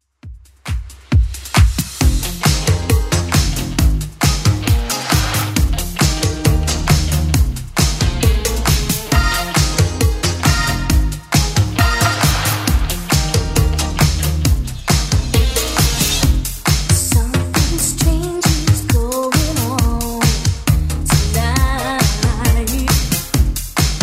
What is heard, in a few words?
80s Club Edit 135bpm